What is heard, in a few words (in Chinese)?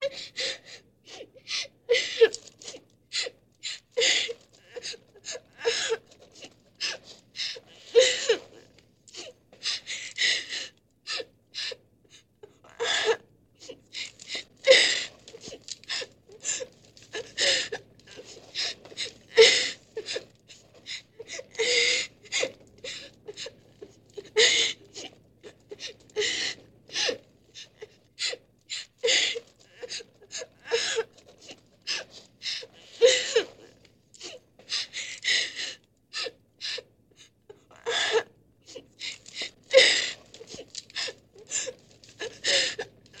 人女孩哭,抽泣声和哭泣; People Girl cryin